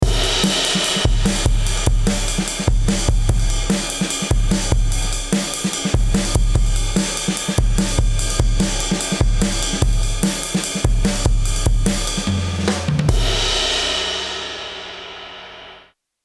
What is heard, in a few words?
Chandler TG 1 Drums; Kramer Trick